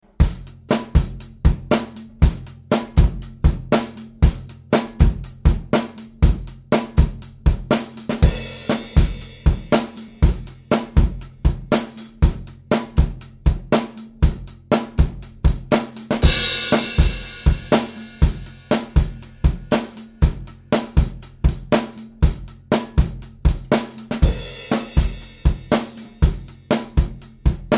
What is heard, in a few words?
EAD Overheads SM57, Yamaha EAD10